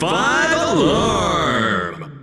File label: alarm